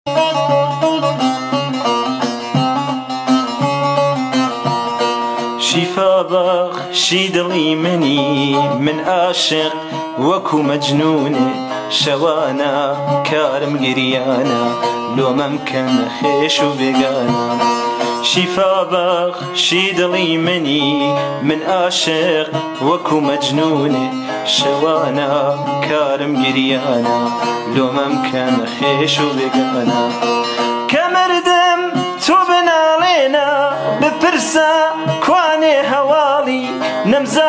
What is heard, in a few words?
kordi ba gitar; kordi ghmgin